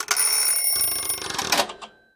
Enemy Time Magic Active Clock Miss; disney star smash android ios mobile gamerip 2020

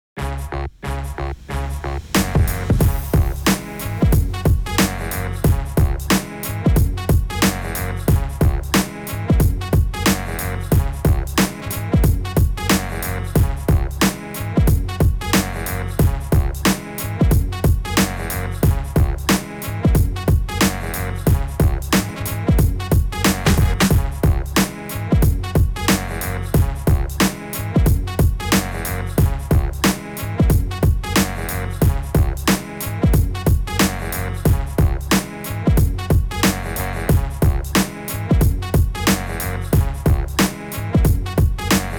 Mister Groove Instrumental; UPM

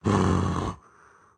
Dash Attack; Caffiend Caelan

